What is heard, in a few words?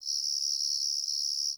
Prairie Day Close Cricket; Ambience